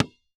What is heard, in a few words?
Sports Ball Basketball Dribble On Wooden Floor Single